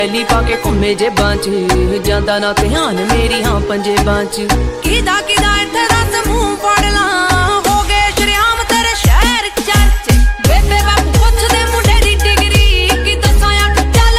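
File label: lovely punjabi ringtone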